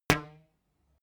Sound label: rubber band pluck long